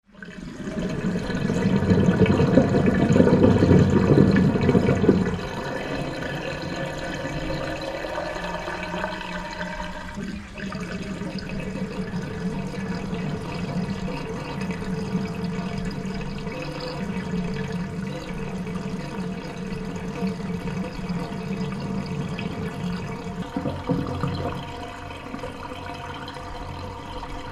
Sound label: Bath Running - Plug in - filling bath - turn off; Bathroom; Household; Tom Hutchings